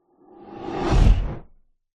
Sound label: fantasy magic spell zap whoosh impact dark airy